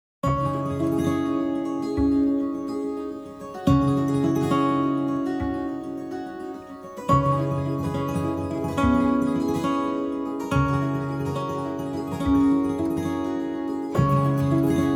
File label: Dreamy Landscapes with voice and strings